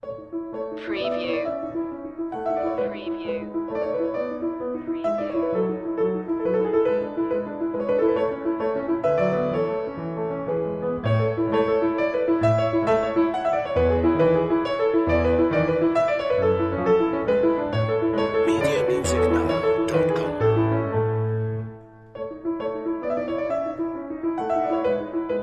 piano sonata no11 a major kv331 1st movement variation 1; variation 2; wolfgang amadeus mozart; classical music for media